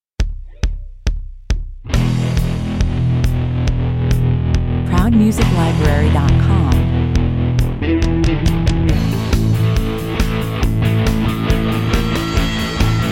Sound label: rock, 138 bpm